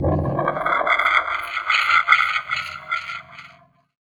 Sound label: weird; Best Service - XXL Production Effects